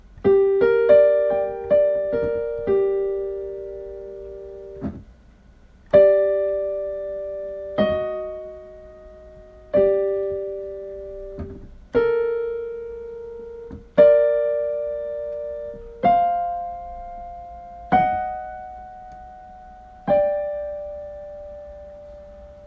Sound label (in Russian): интервалы